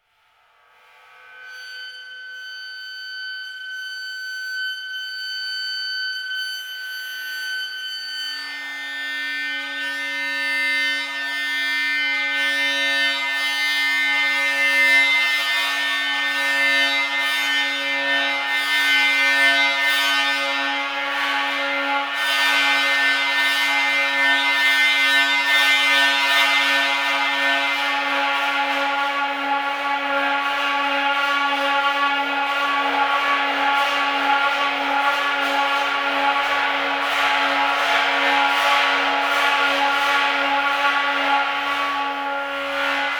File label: pitch to multiphonic, bowed cymbals